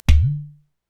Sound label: UDU, Korg EMX-1 drum machine